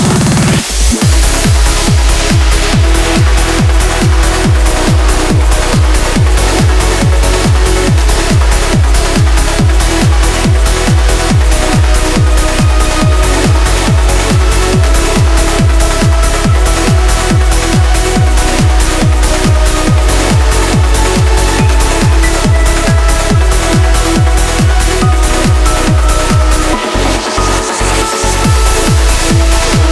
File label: 170 BPM